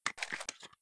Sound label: glock draw, weapons